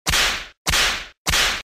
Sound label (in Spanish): sonido del latigo efecto de sonido